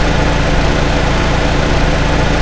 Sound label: Engine Constants, Transportation; E-MU Producer Series Vol. 3 – Hollywood Sound Effects